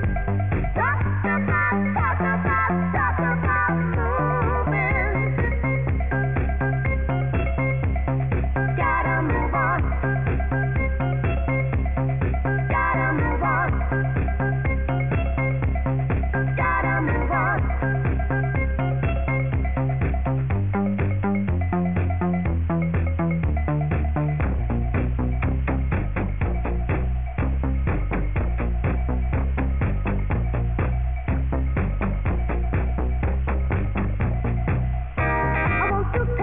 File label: funky